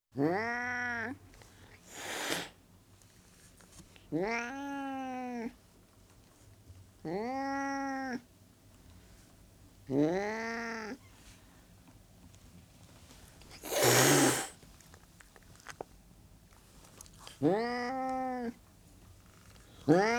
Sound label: Burmese Cat Hissing And Growling; Cats; BBC Sound Effects Library